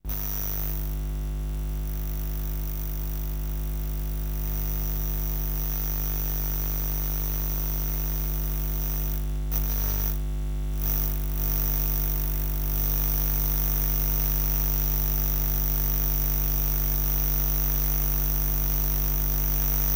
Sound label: Noise